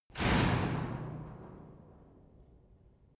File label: garage door shut